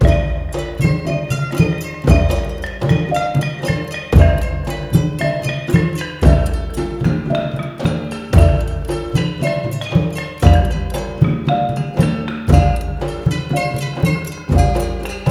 MALAY, Heart of Asia; Spectrasonics - Heart of Asia CD2

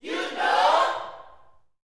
U KNOW, gospel shouts; Spectrasonics; Vocal Planet

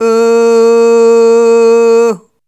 Ooh Short A#2 Dry; Harmonic Building Blocks

